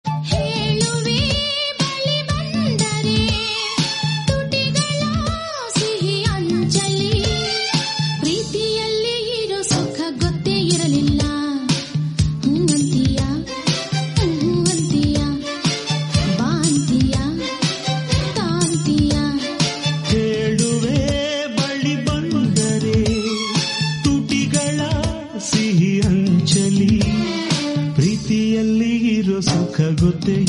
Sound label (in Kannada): heluve bali bandare anjada gandu kannada